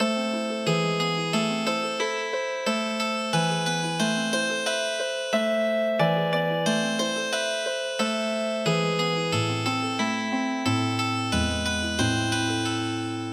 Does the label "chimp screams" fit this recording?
No